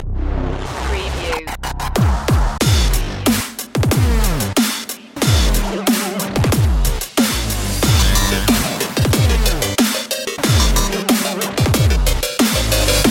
dubstep of frying cpu

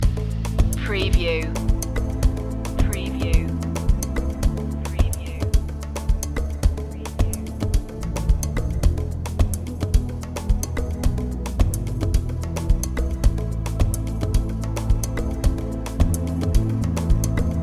bedouin celebration loop

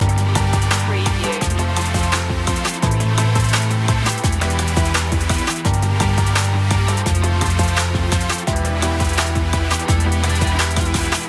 top speed loop

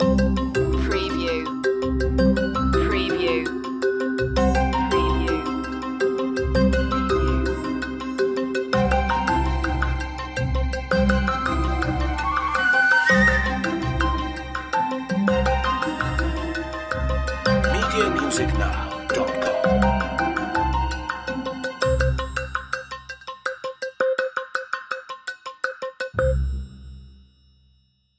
quirky creatures

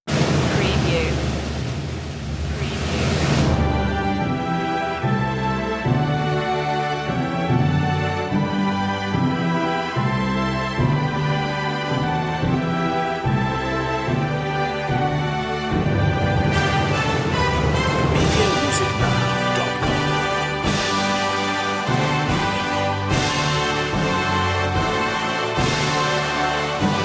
god save the king no fanfare

